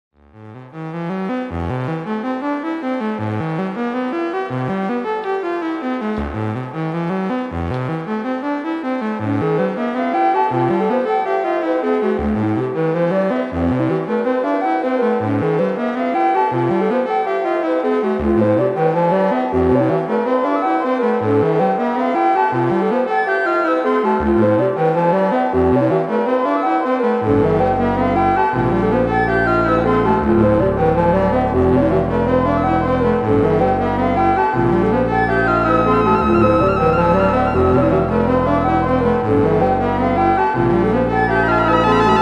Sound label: Yamaha CS01, Dark Fantasy Song, Slowed + Reverb